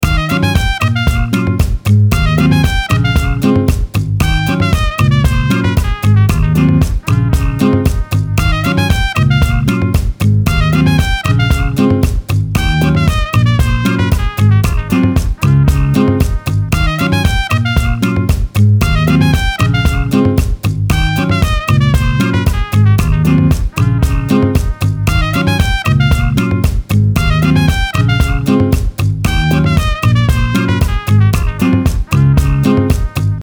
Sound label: Soul Jazz Afro